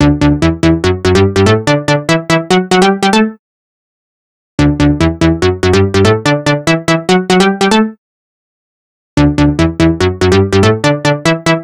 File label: Simple Step Forward, ringtones; LG Wing 5G Verizon